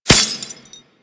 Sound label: Helmet Impact; Warframe